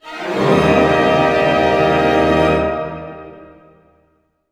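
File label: glissando D# major, orchestra; Sound On Sound - Peter Siedleczek's Orchestra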